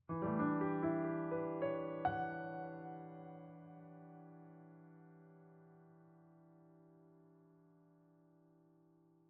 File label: Factory Boss Piano Coda; music